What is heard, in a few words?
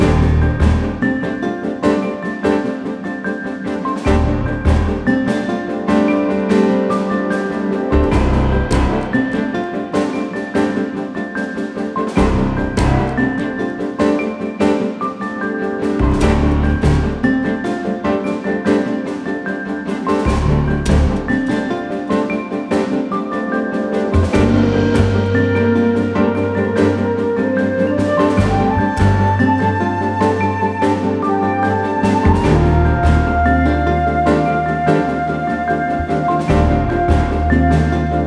highkey loop 148bpm GMin; chicory a colorful tale; ps4